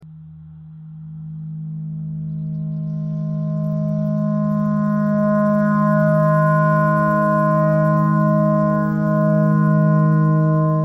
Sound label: clarinet clarinet notes e simple